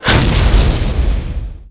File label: fuel drum; specops